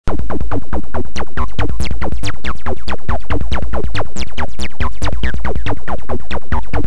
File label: loop